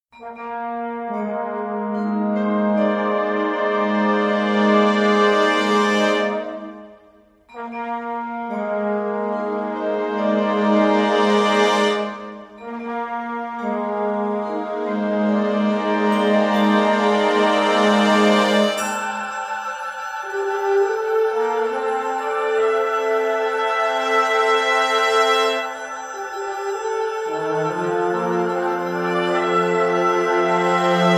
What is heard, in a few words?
Fanfare, wind ensemble